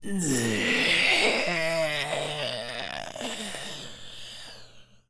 Male Taunt, Zombies; zps; Male1

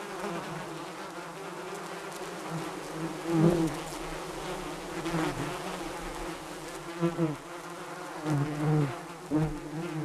Flys; zps; Ambient; Flies

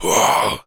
Jump, Zombies; zps; Carrier

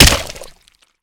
Flesh Head, Gibs; zps

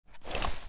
IED Pull; zps; Weapons; Explosives

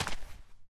dirt, Footsteps; zps; Foley

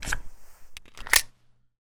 Glock Reload; zps; Weapons; Firearms

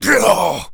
Pain, Zombies; zps; Carrier

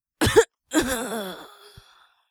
Death Rattle; zps

